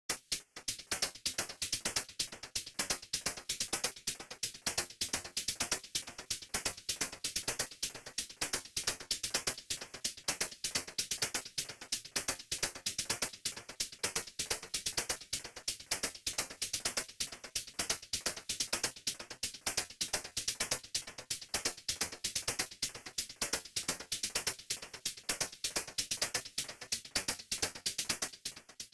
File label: Noise Loop